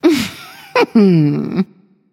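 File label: heh heh, female; smite; voicepack; hera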